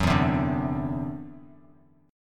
E 7th Suspended 2nd Sharp 5th, E, down, Bass Standard; E7sus2#5; strummed